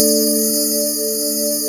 PADY CHORD

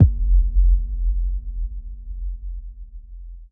kick dirty sub; Polyend; Recue

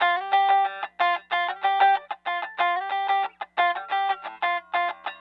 Call And Response Guitar, 70s Analog Funk

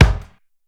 SPEED KICK, drums; Basement drums vol 2

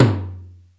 WS TOM, drum; Crypton - DrumBase5000; WS DRUMS

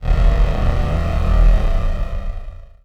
Basz, One Shots; Sonic Foundry (Sony Creative Software) - Future Retro Dance Excursions

